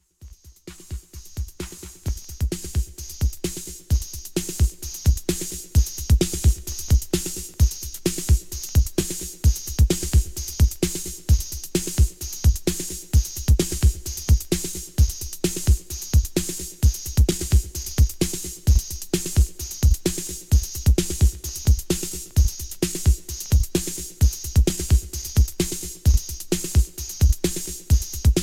scratch beat; beat junkie turntable tools

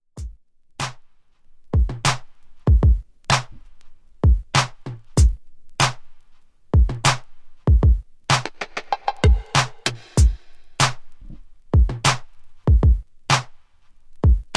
groove

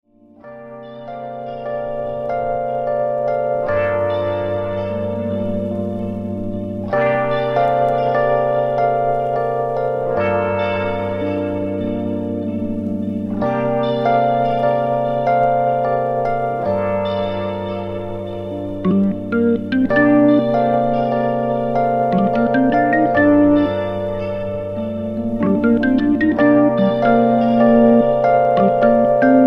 instrumental; persona 5

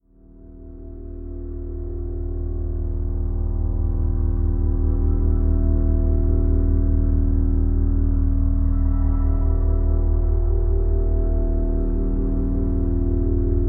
beat

